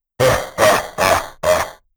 alert

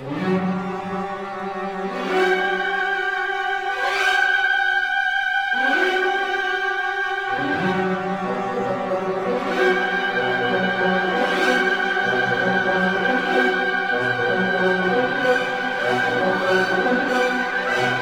alert music; svencoop; slender

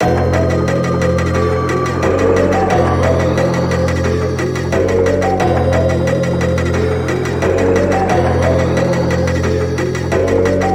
idle music; roblox; coolkidd; slender

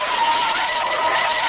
kart drift; tf2ware ultimate; mariokart

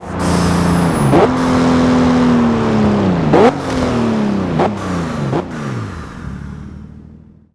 throttle off; mas ghibli; vehicles; tdmcars

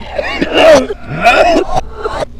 normal idle; floaters; slender

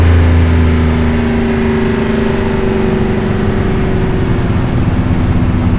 revdown; fordrafa; nfs heat ganimator pack

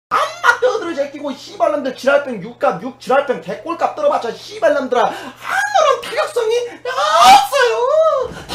scout positive vocalization

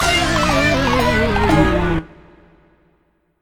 failure; tf2ware ultimate; music game

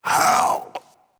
gorefast hurt; kf2; gorefiend; slender

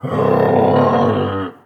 idle, male zombie; nmrih; zerosiki; slender

